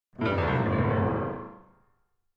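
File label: Grand Piano Short Descending; keyboards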